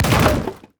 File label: tower brew Master basic Attack impact